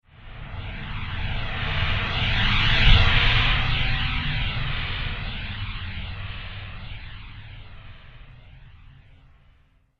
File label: Astro Flanger - Transition; Transition Sound Effects